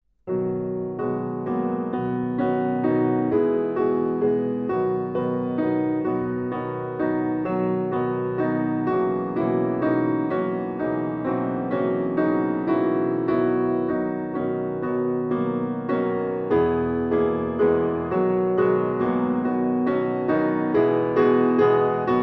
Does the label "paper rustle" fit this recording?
No